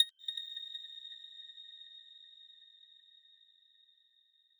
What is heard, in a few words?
arp